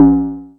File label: Tom Analog; Drums